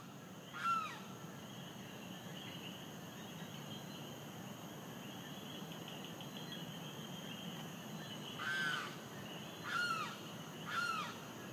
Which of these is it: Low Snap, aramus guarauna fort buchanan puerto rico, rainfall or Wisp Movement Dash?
aramus guarauna fort buchanan puerto rico